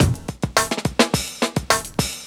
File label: JAZZLOOP; SoundFactory; DRUMLOOP